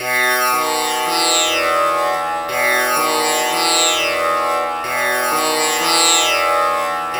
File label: TAMPURA; Spectrasonics; Heart of Asia